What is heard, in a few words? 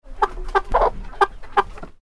hen dozed; Cocorico